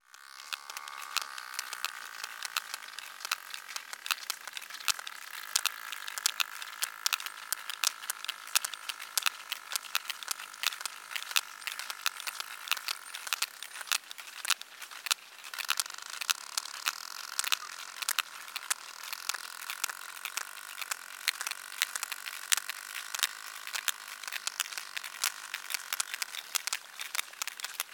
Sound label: whale sperm